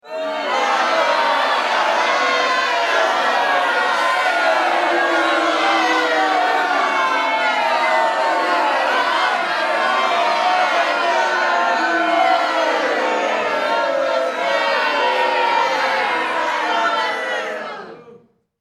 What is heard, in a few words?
Crowd Boo